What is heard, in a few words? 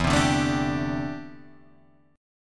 D 7th Suspended 2nd Suspended 4th E